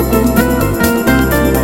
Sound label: A Cool Bossa Nova